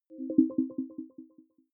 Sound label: button echo chord arpeggio